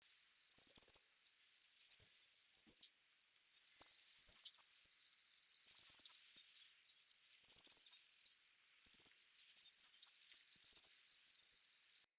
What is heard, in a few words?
Critter Loop, Ambience, Glades